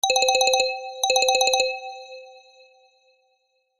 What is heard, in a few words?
iPhone 11 Bell